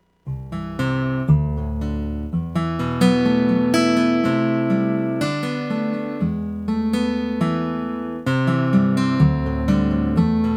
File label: Pure Acoustic